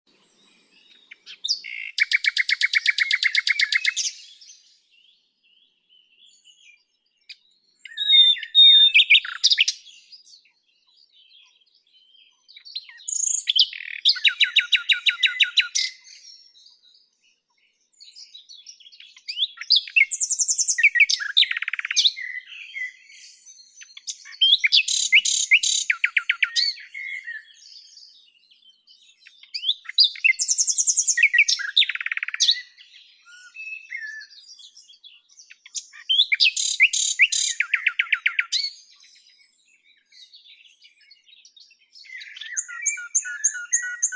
zvuki prirodi solovei uzhnii luscinia megarhynchos

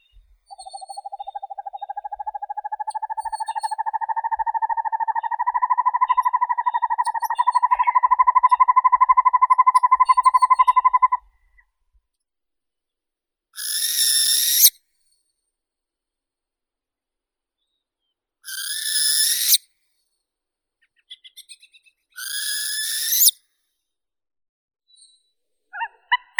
sova yastrebinaya surnia ulula, zvuki prirodi

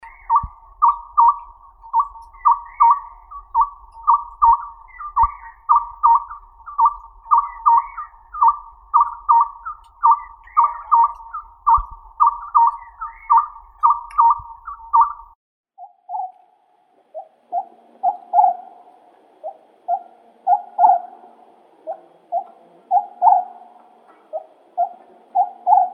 zvuki prirodi sovka vostochnaya otus sunia

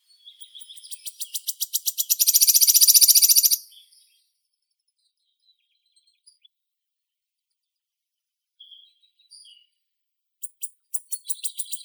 penochka treshotka phylloscopus sibilatrix

